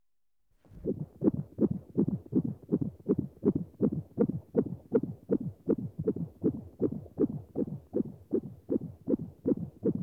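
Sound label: Foetal heart beat; Hospitals; BBC Sound Effects Library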